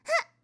jump, Catgirl Fighting SFX

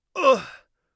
hit, Mage Voice Pack

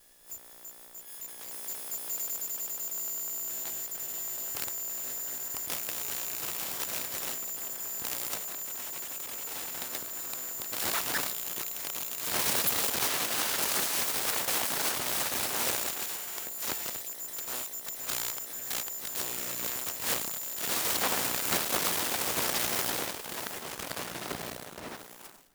Electromagnetic Macbook Sequence; Essentials Series NOX SOUND

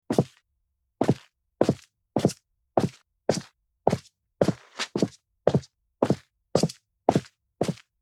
Concrete Footsteps; horror; horror sfx vol 1

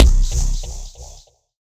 Hit Impact; FREE SFX PACK VOL 1

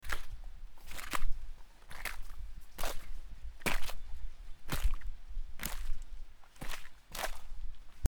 Mud Footsteps; horror sfx vol 2

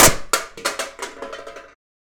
Drop Plate; FREE SFX PACK VOL 3

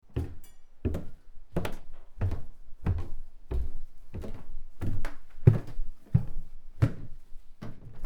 Stair Footsteps; horror; horror sfx vol 2

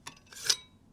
Small Knife - Unsheath, kitchen knives; Micro Pack

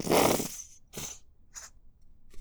Plop Airy, Bottle Plops

